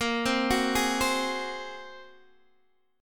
A sharp 7th Suspended 2nd Sharp 5th, Guitar Open D chord; x,13,10,12,11,10; down